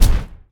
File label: footstep